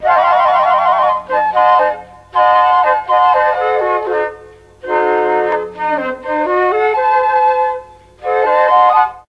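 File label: F4 flute, mellotron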